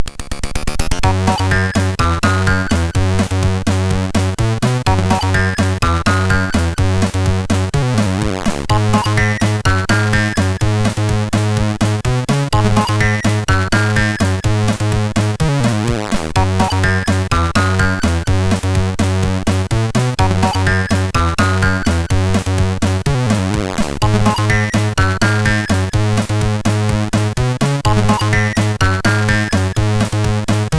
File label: Techno, MOS6581R4; sid